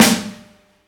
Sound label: sizzle steel snare drum a sharp key